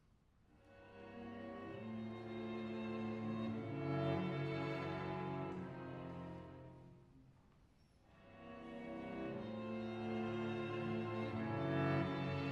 String Octet; Harry Sdraulig

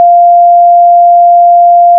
receding pitch shift

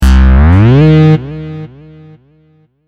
device activation

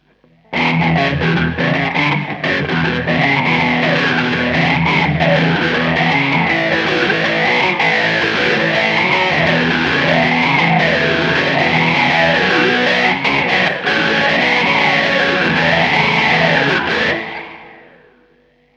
blues overdrive autowah

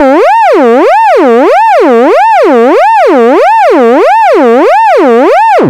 alert

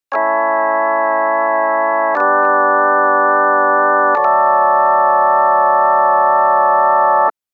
Organ

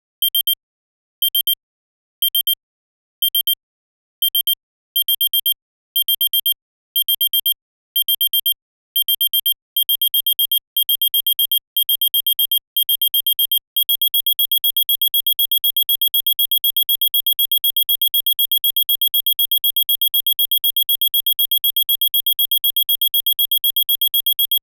Alarm sound

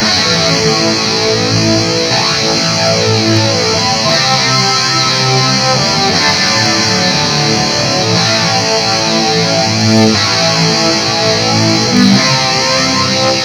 flange Guitar

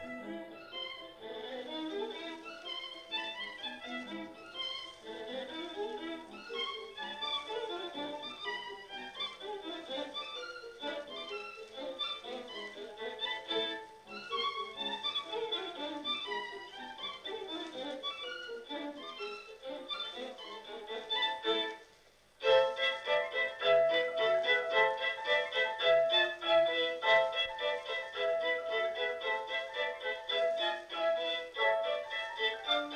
Paganini Caprice N.24; Cillario

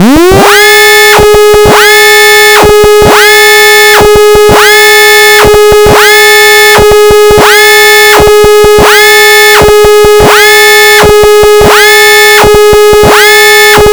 evacuation

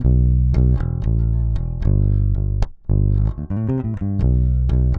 Moon Bass

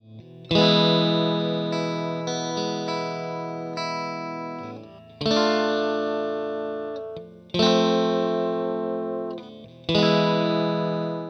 JC120 Mono Dry

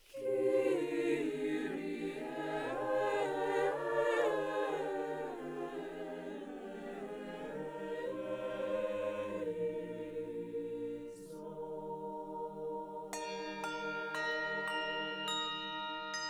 anechoic choir

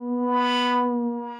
Collision

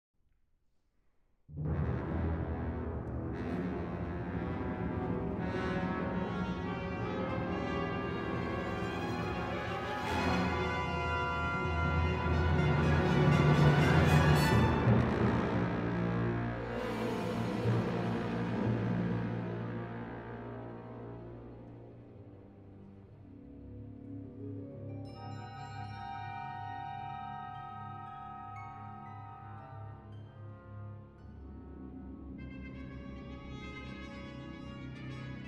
solo piccolo and orchestra; Harry Sdraulig

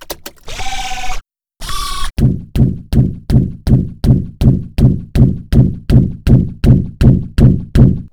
robot flanger

